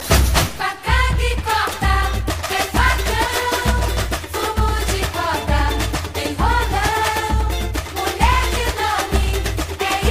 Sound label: fanfarra samba